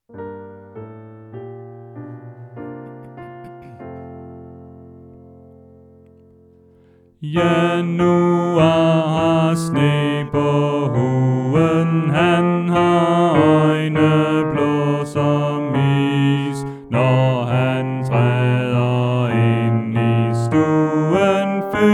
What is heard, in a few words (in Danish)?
Bas